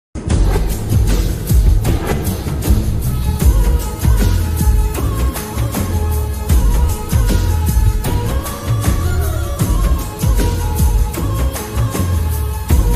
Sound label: bgm